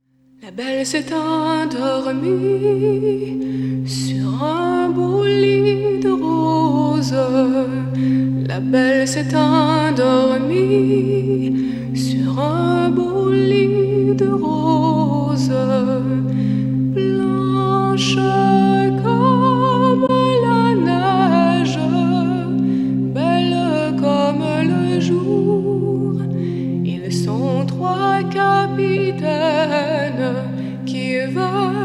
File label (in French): chant